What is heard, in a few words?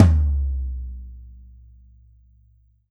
16 hi, Drums&Percussion; E-MU Producer Series Vol. 7 – Old World Instruments